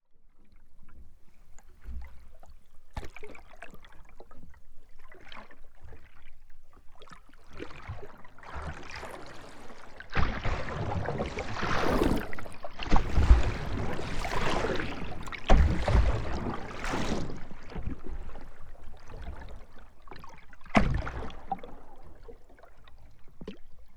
Waves Splashing Boat, Medium, Hitting, Sea, Ocean, Underwater; Boat Ride; LukasTvrdon